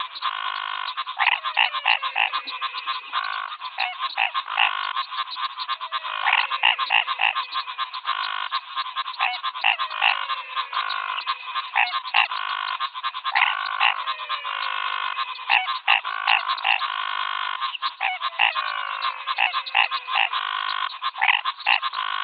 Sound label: Froggy Rush Hour Pantanal Brazil